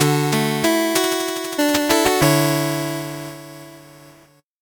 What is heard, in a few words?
8bit jingle slow